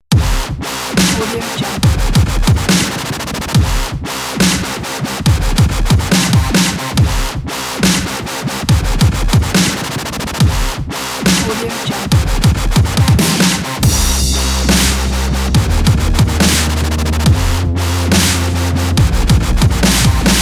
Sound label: dubstep breaks